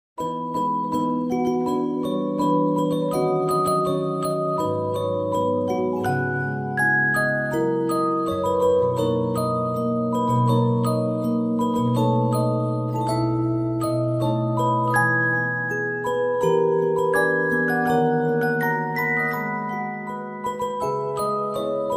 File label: music box ver.